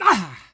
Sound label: male damage; Human